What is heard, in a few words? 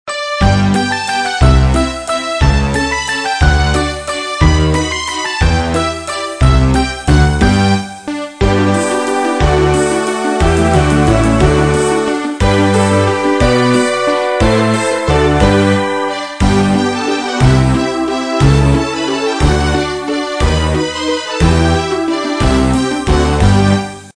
Menu Background